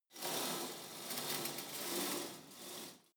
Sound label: pullup machine cable pull soft; sport freizeit; fitnessstudio